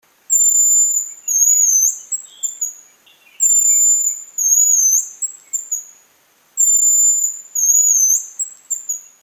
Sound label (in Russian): ryabchik, birds